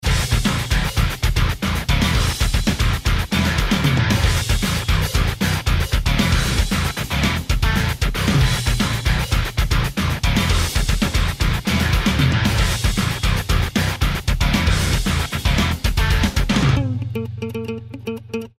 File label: djent Alarm